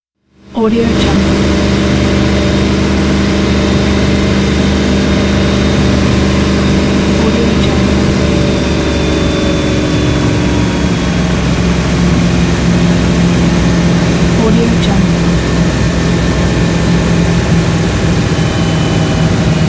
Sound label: boat ride on board; wistansound